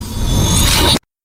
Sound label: saber deactivate